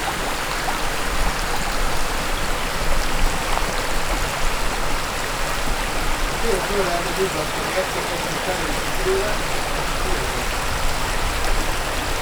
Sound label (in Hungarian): csobogo patak, jobbra beszelgeto emberek, turistak; visegrad